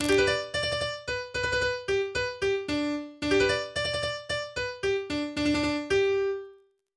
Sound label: First Call Piano